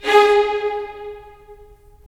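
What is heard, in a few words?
strings violins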